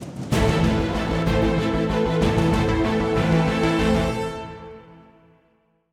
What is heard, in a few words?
190bpm; Legends of Pegasus; windows gamerip 2012